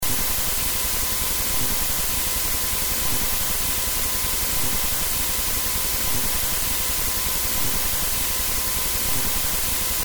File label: mic off